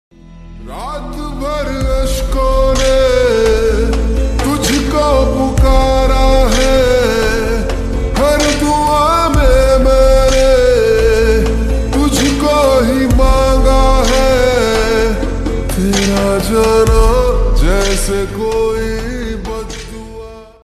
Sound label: Slowed Reverb Ringtone